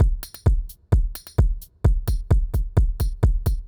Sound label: its jazzy 130